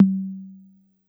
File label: C3 Analog Tom, Dance Kit